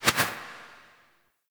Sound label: Collectible Tarot Card Click Flip; Warframe